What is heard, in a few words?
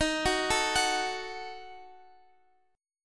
Eb Diminished, Guitar, Open F; Ebdim arpeggiated